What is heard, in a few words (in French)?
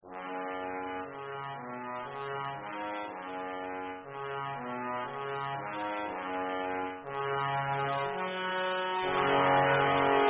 trombone exemple sonore